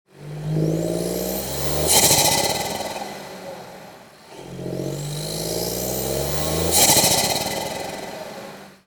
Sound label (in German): Fahrt, intake 1.0t 1.5t EA211 evo; Ansaugung